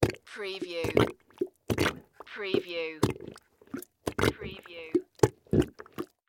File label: Mud Suction Plunger